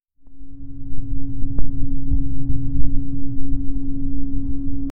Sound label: a powerful energy aura fl